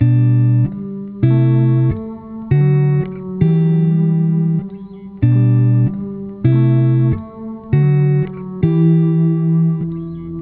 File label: em 92bpm processed